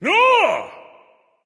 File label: grunt attack; smite; thoth